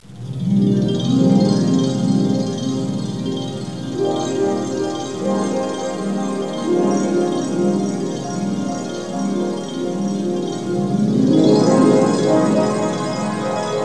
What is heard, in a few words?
Pad; Great Eagle 2